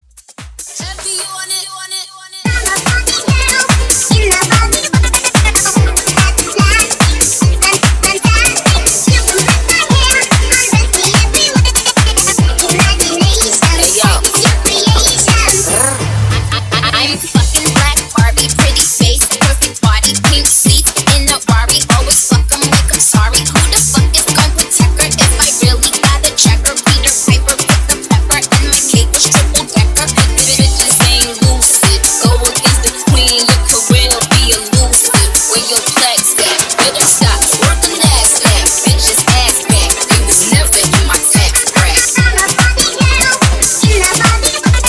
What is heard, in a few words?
Club Dance Fast Beat 145 BPM